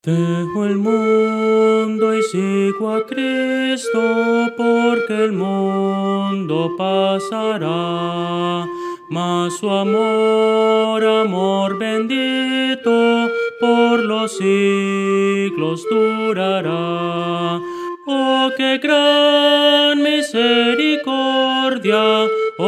soprano

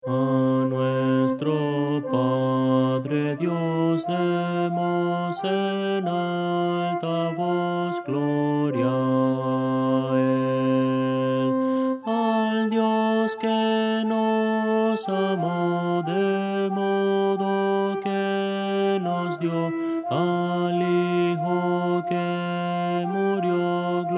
contralto; a nuestro padre dios

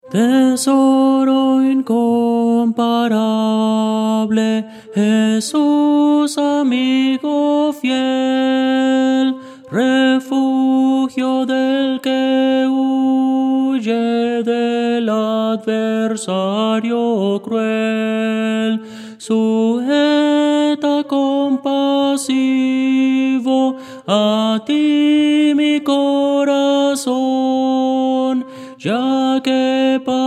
tenor; tesoro incomparable

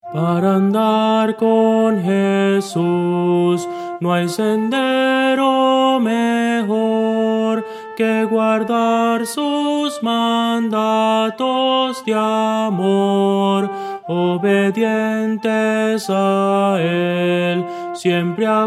soprano

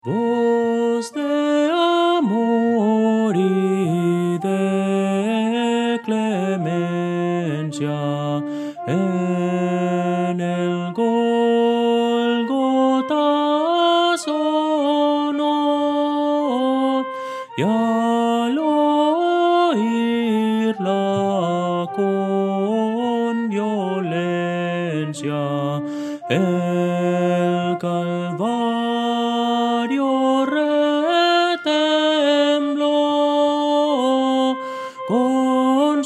melodia

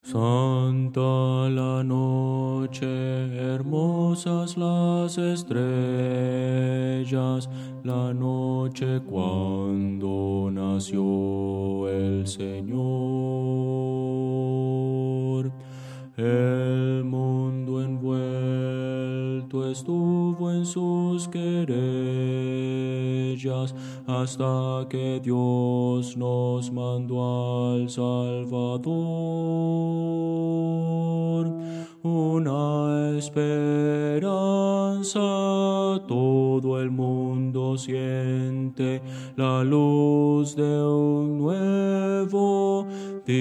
bajo

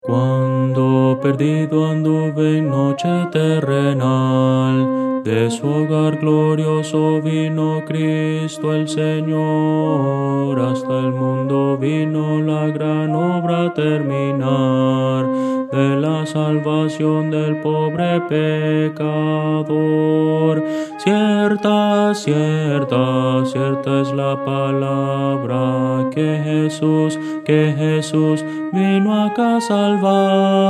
contralto, himnos cristianos; Voces para coro

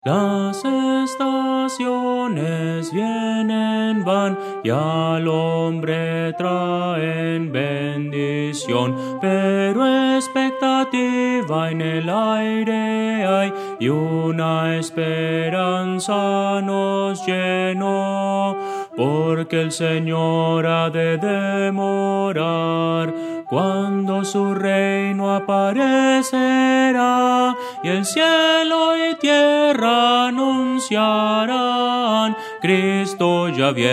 soprano

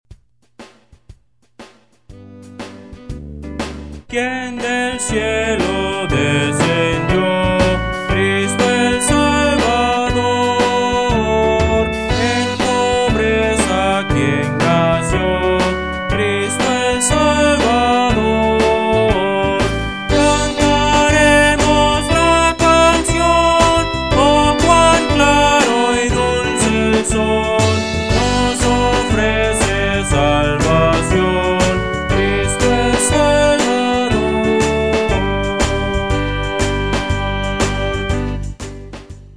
quien del cielo descendio, Himnos Cristianos

